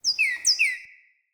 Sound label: Cardinal Female Bird Song